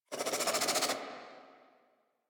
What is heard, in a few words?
Infested Crawler Attack; Warframe